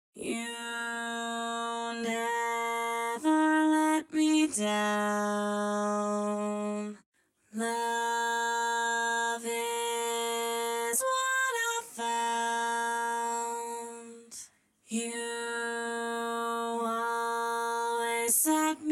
harmony; SolidInc

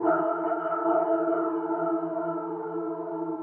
techno pad 140; Techno2; Keys

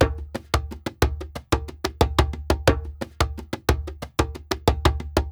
ETHNO PERCUSSION 90; Beats; ethno2

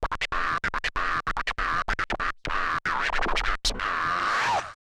OLDSCHOOL SCRATCH 96

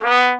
trumpet staccato B2; Orchestral; DirectWave; Varazdin Orchestral

